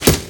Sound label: hits